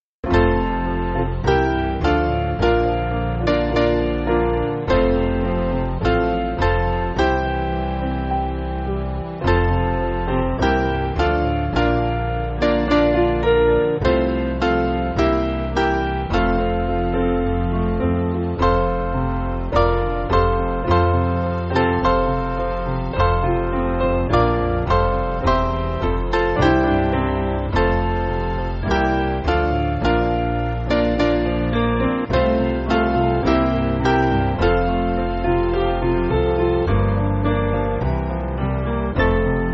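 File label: Piano, Music Backing Files for Churches; Bethany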